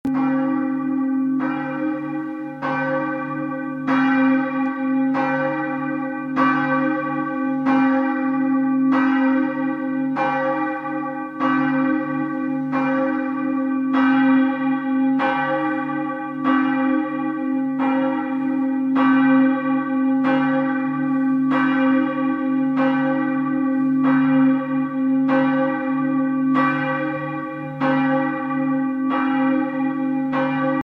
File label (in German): Abendglocke